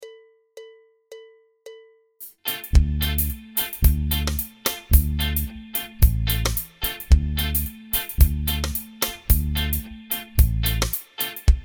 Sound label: impro dm7